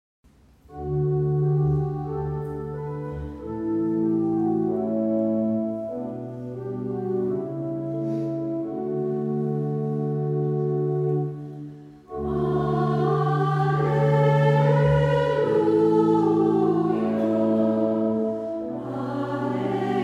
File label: Speak choir